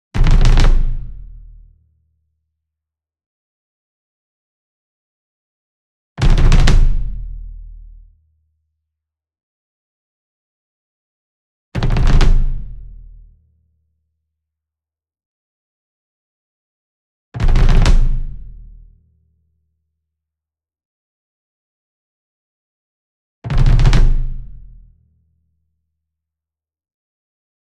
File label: ENSEMBLE DRUM Bass Drum Marching Roll Near; boom library; Cinematic Strikes Construction Kit